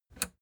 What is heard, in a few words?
plastic button latch release enable